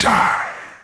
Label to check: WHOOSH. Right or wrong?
Wrong